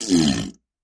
Bowl Fart Relief; farts

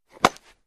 Baseball Bat Alumin; baseball fx & games

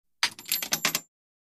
Coin Drop Slot Coin Dr, coins

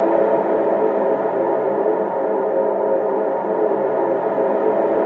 Starship Engine Rum

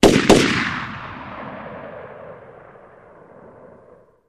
Machine Gun 2 Shot Bu, bursts

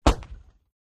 105mm Howitzer Shell

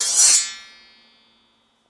Scrape Metal On Meta, blades

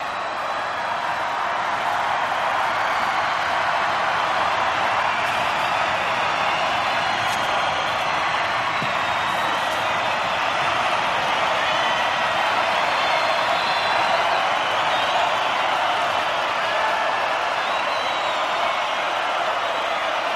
Crowd, concert

